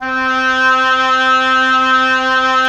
WND OB F, Oboe f; Roland - LA Composer vol. 4

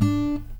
ACSSFTFNGR, Guitar; 90 s Sample CDs; Quantum Leap - Guitar & Bass

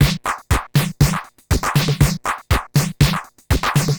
Distorted TR 505 (120BPM), beats

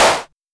Simmons Clap

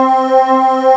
AIRWSYNTH; CMP - Analogon

